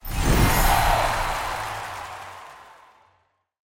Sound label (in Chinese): 展开; nba; 王朝之路